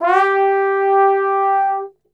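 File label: G 3 trombone glissando, Brass Super Section; Best Service; TRMB GLISS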